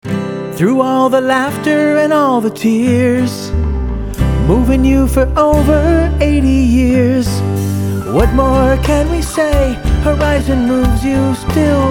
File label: Jingle